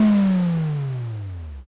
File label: dark magic